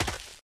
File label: grass, player footsteps survivor walk; source engine; left 4 dead 2